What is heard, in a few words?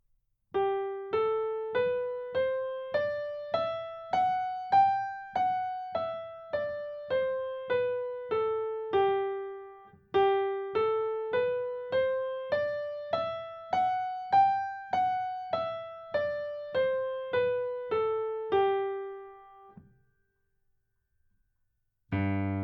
G Major Scale Exercise